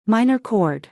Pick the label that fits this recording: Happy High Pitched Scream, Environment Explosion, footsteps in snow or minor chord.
minor chord